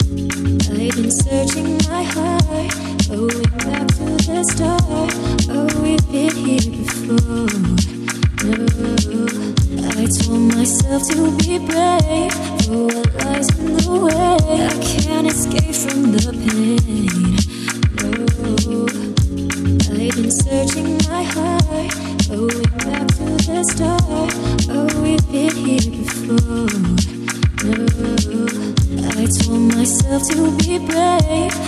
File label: Chillout Funk Fusion